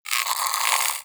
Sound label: Rodent Vocal Snore; Warframe; Hunting Critters; Characters